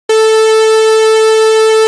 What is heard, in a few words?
Subsynth wavemix